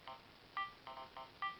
motorola razr ringtones drum

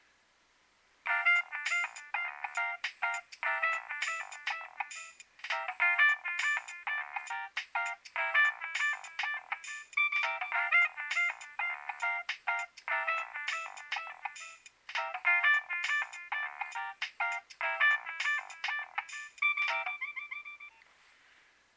nokia3100 ringtone

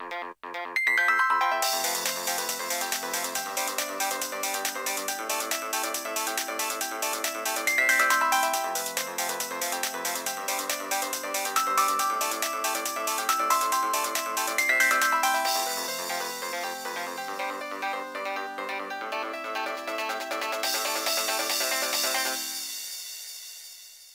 nokia 1600 nocturnal ringtone

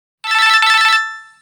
Bells, ringtones

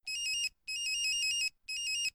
motorola startac ring style ringtone

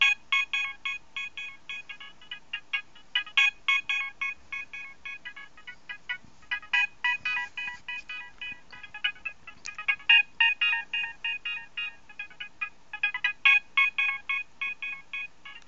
nokia3510i clubbin ringtone

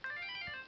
motorola razr ringtones chimes high

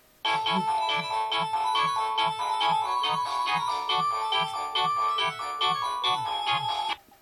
samsung sghe700 ringtone

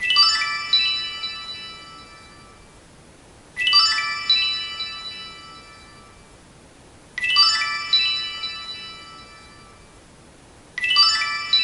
nokia 1680 diplex ringtone

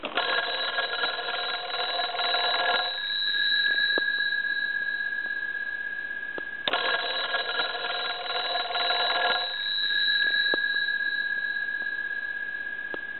candlestick telephone ring

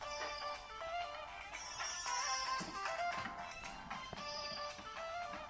bollywood, ringtones

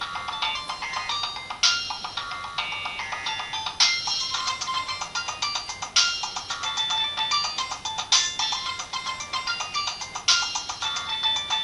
nokia 1680 ringtone

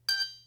motorola krzr k1 ringtones

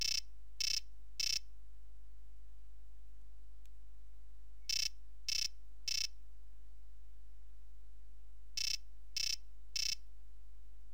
nokia 3310 frog ringtone

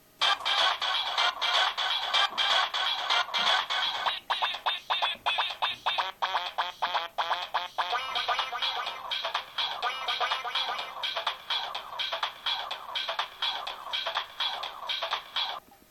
samsung sghe700 ringtone

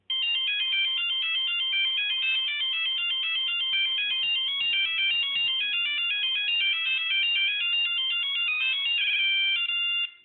nokia 1100 fuga ringtone

